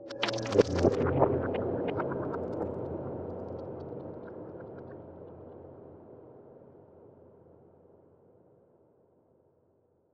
granular texture atmospheric experimental intense trailer elements; sonniss gdc